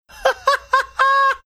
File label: funny laugh